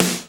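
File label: snare drum; Best Service Gigapack 2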